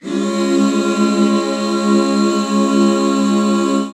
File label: HAL DB, BACK CHORDS, Roland; Sound & Vision - Gigapack II CD 1